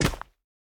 footstep normal; smite; vulcan

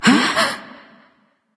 surprised; smite

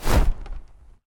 wing flap, manticore; smite

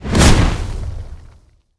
explode; smite; god sol

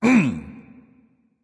clear throat

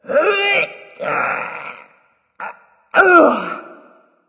grunt lift; smite; izanami

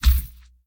movement step; smite; god sobek

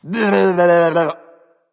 nv tongue; smite; hachiman

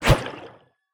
swing goad; smite; god ganesha skin11

